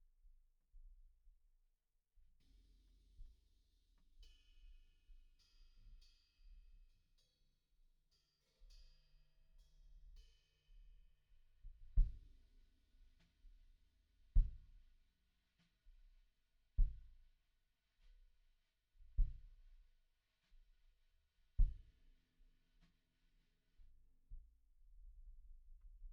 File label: Kick In